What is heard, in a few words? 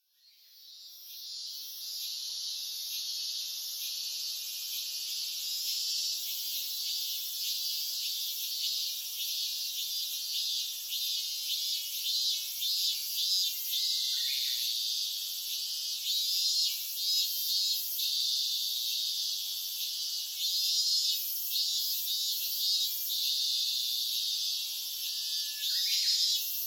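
robust cicada; minminzemi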